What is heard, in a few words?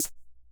Ace Tone Rhythm Fever Snare, Drum Machines; 300 Drum Machines